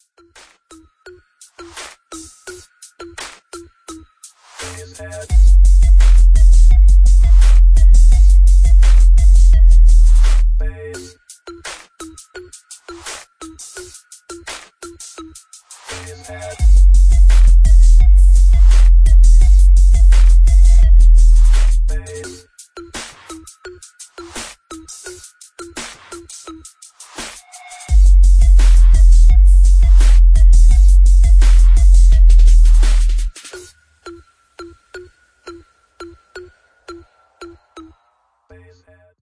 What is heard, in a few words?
bass boost